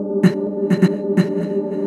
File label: House Loops, Vocal Loops House 128BPM